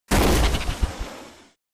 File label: Jumpjet Jet Start Flame; Titanfall 2